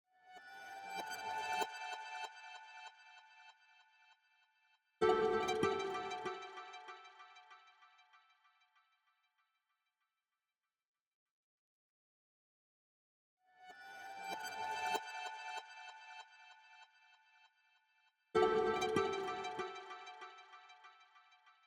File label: 144 BPM E Min Guitar, Drill; Cymatics; PARADOX II; Melody Collection; Casket